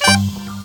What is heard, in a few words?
SAX FX; Ragga & Dub Reggae; BRASS KIT; AKAI; USB Soundscan vol.27